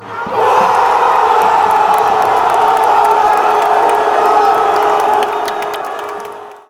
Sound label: goal, fanchants